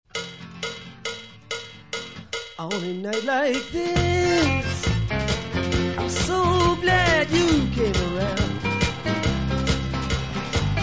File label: audio pop